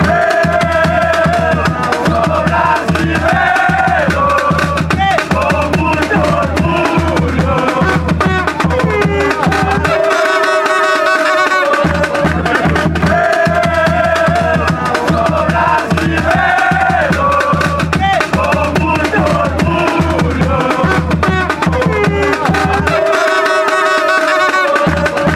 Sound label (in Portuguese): eu sou brasileiro com muito orgulho com tambores, chants